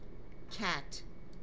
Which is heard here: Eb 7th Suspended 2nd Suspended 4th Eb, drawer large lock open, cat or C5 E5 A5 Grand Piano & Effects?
cat